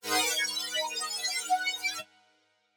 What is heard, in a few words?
synth pad; nacre